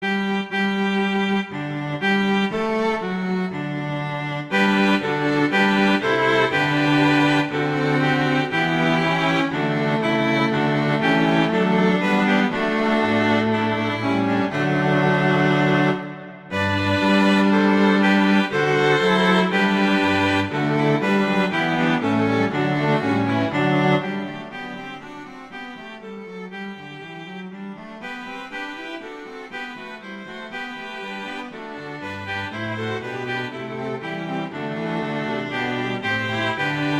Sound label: Strings; Christmas Strings; O Come All Ye Faithful - Adeste Fideles